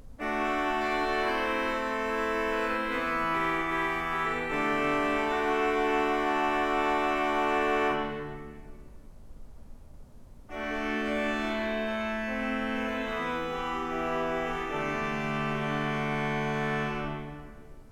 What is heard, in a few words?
bombarde, organs